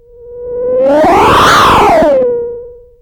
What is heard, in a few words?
HOWL, WIND RATTLERS; Zero-G Ambient 1